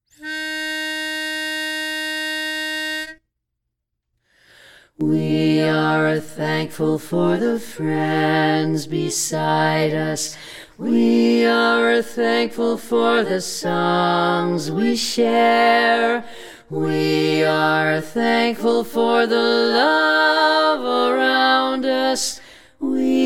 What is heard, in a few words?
Bass Dominant